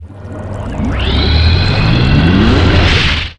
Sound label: battery power